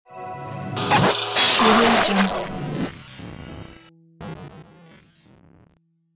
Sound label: elegant glitch intro reveal